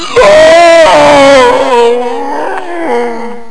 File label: laaaaaaa